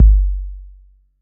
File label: BD 808